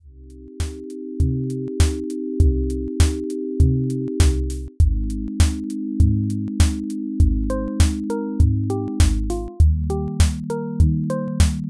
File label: music bed; timmy talking turd